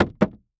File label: Foley Footstep High Heels Jump On Wood Platform; Foley Footsteps